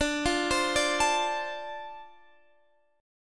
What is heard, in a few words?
D-Minor 7th D, chord; Dm7 arpeggiated; x,17,15,17,15,17